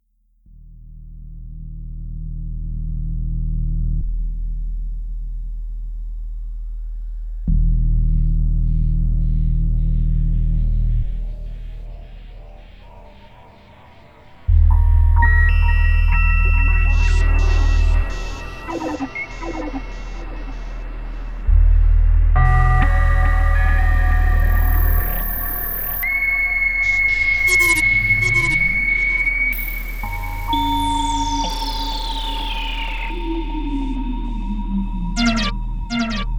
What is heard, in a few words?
Noises